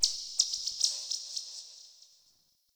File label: Bird, Ambience, Garden; Eternium Garden